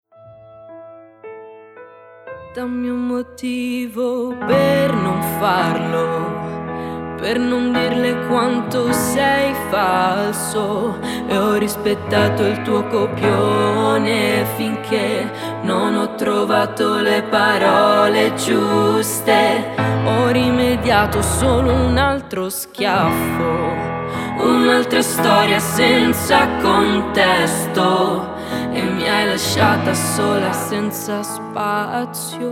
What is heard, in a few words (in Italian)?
Piano VOCI